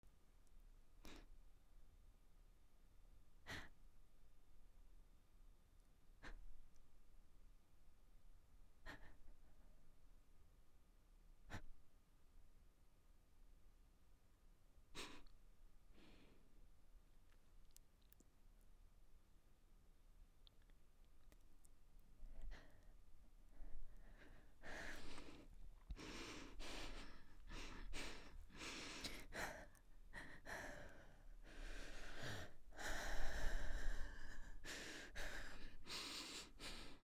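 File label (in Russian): короткий вздох женщины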